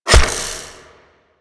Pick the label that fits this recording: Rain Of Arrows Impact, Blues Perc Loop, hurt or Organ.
Rain Of Arrows Impact